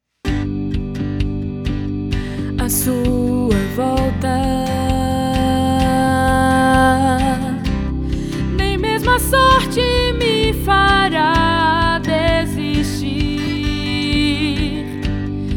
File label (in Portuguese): contralto; coral; kit contralto; coral novo canto